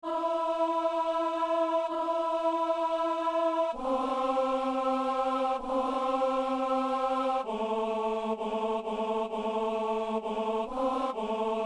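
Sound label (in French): ténor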